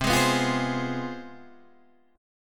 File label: C Major 7th Suspended 4th Sharp 5th, down, Guitar, Standard; CM7sus4#5 strummed; JGuitar